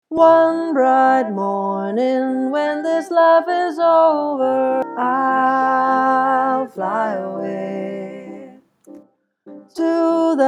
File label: tenor